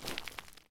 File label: gravel